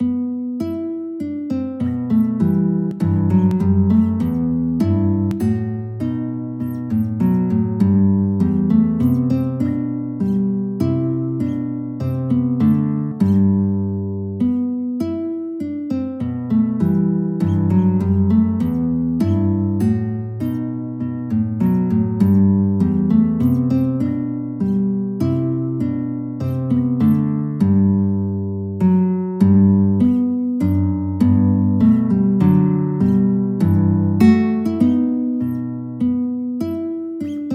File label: Minuet, guitar; Purcell